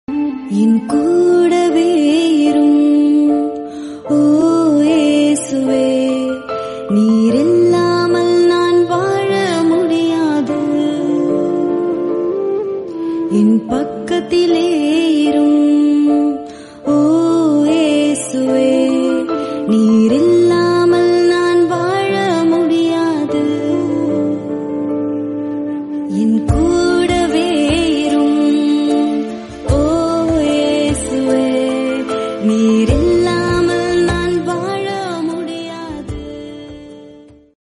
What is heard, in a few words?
Malayalam Song